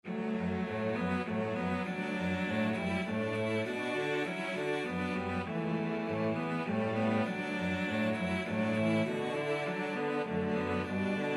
arirang, cello trio

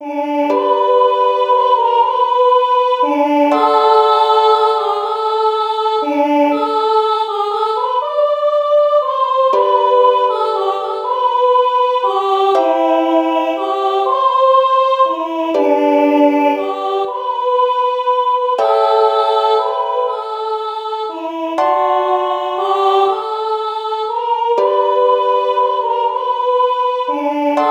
UKE, ukulele

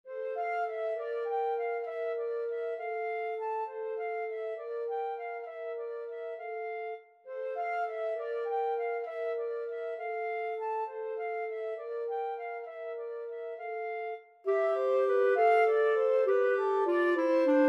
reapers, flute clarinet duet

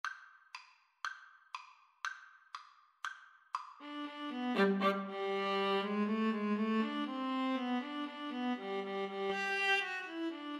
Viola Cello Duet

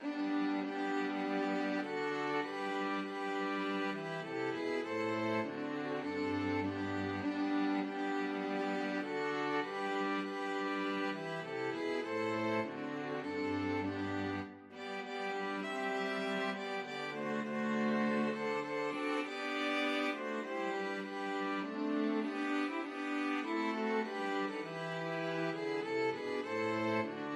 STRING quartet; christmas tree

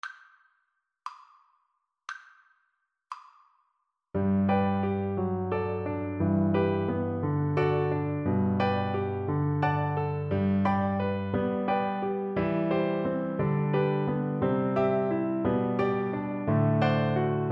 lieder, Flute; brahms 5 lieder o liebliche wangen

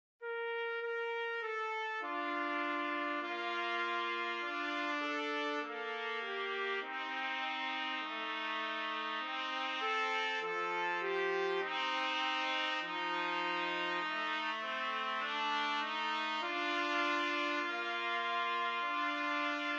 o sole mio, trumpet

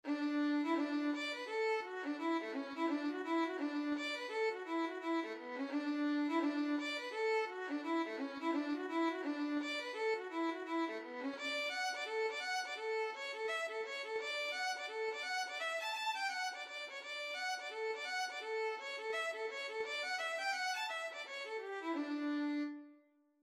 violin

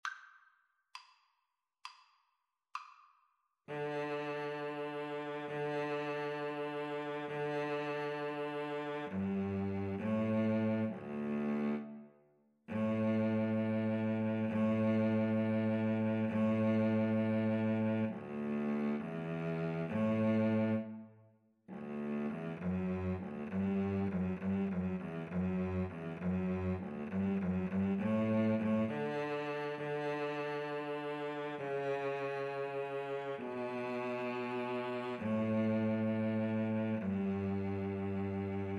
Violin Cello Duet; pastorale

